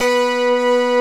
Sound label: PPG sync B4, PPG Wave 1; Greytsounds VOL-1